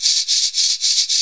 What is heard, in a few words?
FAST SHAKER; Zero-G Ambient 1; MISC SHAKERS